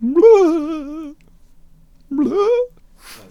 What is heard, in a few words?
Healer Attack Vocals